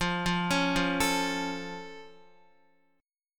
F Augmented F down Guitar; arpeggiated

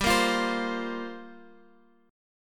G Minor 11th G down Guitar Open F; Gm11 strummed

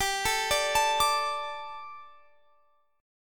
D 5th G; D5/G arpeggiated; JGuitar

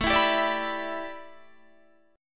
A 9th Suspended 4th Guitar Standard, chord; A9sus4 strummed; capo=3

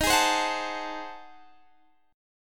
Ab 7th Flat 9th Eb, chord, guitar

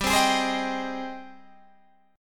Ab Major 7th, chord

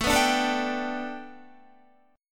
A 7th Suspended 2nd Suspended 4th Guitar; A7sus2sus4 strummed

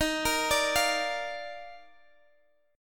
Eb Major 7th Suspended 2nd, Guitar Open F; x,22,22,21,20,x; arpeggiated

